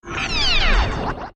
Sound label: Space Laser Zap